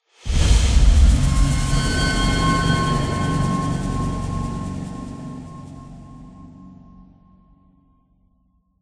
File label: JINGLE DEFEAT; prodigy tactics windows gamerip 2018